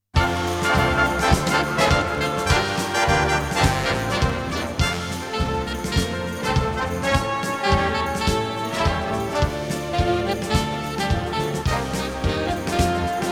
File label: samba; Сборник танцевальной музыки